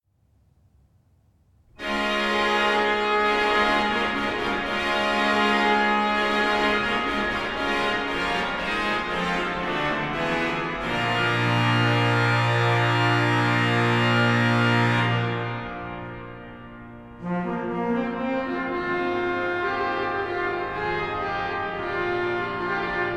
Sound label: fanfare